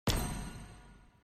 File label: close